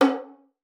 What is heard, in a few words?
FING C#4, VIOLIN; Xsample VOL-5